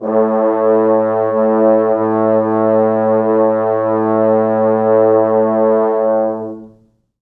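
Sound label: 4 French horns sustain A1; East West; Quantum Leap Horns Sax; 4 FrHorns SUS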